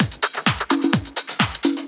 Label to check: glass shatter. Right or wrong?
Wrong